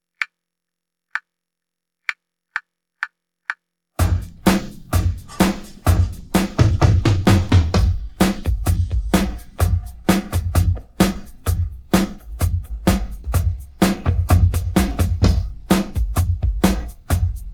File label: pattern, drums